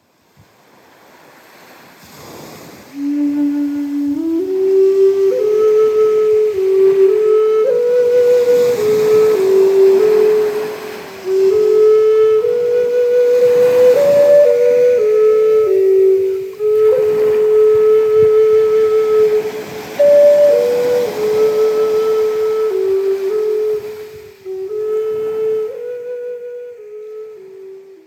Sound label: indian flute